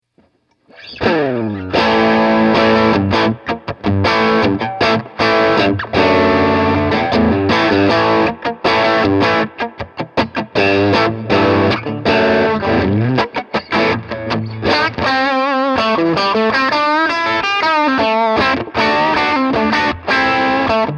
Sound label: Telecaster AC15